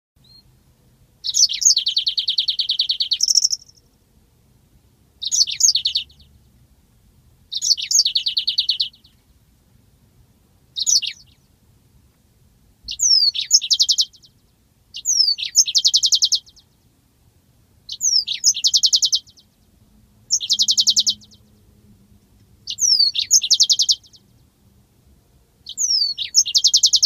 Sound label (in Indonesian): mozambik